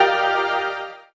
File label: highkey c4